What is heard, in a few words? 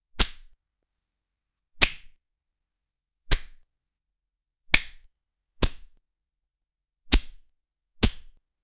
sharp smack of boxing glo